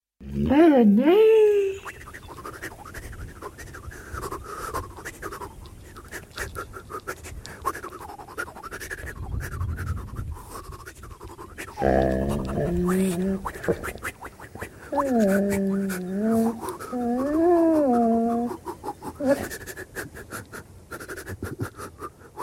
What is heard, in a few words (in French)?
wah wah